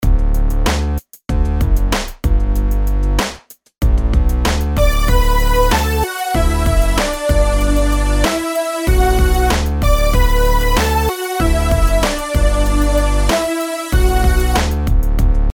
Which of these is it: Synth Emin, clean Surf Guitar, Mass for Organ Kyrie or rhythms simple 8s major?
rhythms simple 8s major